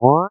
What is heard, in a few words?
wah